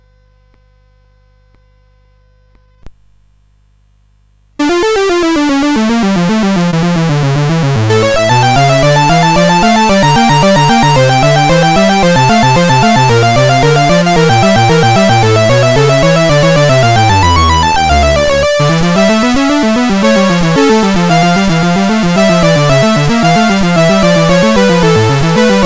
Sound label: Allegro in D Minor, CSG8580R5